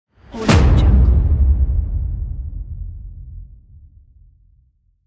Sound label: hit